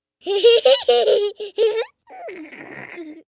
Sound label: Laugh Giggle Hee, Laugh, 1 child, 4 yrs, hee hee giggle, human voice, kid; Laughter; VoiceBox